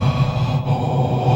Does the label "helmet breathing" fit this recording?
Yes